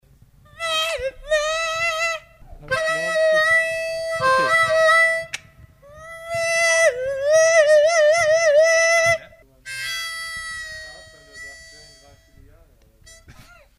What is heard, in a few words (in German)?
Schrei